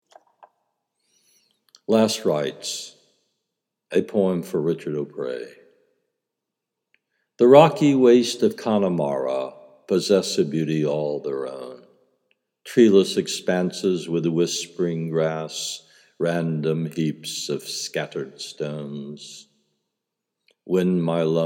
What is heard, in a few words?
natural vocal, blues